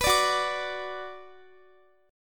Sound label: Gb Suspended 4th Sharp 5th Gb, down, Guitar